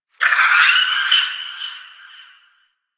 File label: Settlement Creature High Mid; Ambience; Warframe; Grineer Settlement